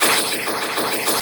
3-D MACHIN; Distored Reality

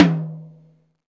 TOM, Roland; Club-50 - Foundations Roland; Ext.Snare 4

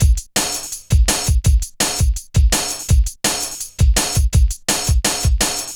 Programmed Stepz, Jungle Drum Loops; Zero-G Jungle Warfare 1, 2 & 3; 90 s Sample CDs

